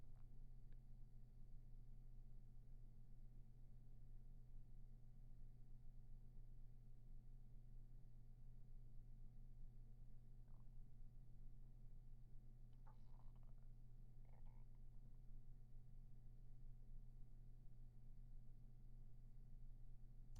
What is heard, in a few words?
room tone